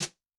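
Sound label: Brush Tap, Yamaha DTXpress-II snare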